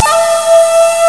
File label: jungle